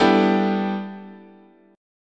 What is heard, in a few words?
G 6th Add 9th, chord, guitar